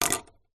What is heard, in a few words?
household cigarette lighter plastic set down on wooden table; zapsplat